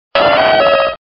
Grito de Donphan

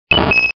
Grito de Clefairy

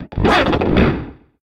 Grito de Gurdurr

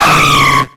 Grito de Delibird

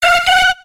Grito de Natu

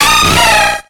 Grito de Azumarill

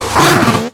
Grito de Swinub

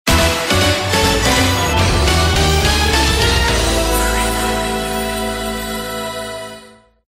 Tema de victoria; Bayonetta; SSB4

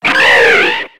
Grito de Braviary

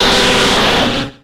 Grito de Crobat

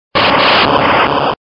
Grito de Electrode

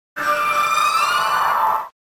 Grito de Froslass

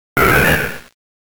Grito de Nosepass

